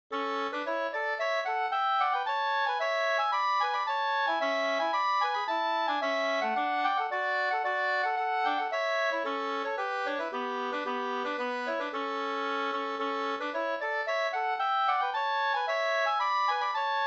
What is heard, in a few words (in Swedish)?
polska springdans